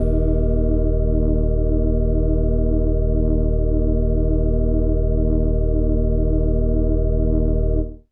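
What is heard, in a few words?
Indie Organ Kawaii D#1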